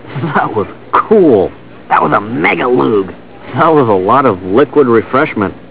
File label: spitting